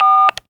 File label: phone; 1000fx; OFFICE